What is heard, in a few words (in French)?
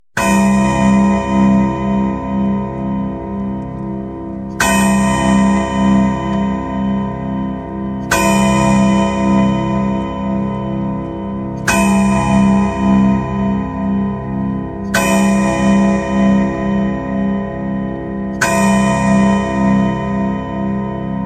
horloge 12 coups de minuit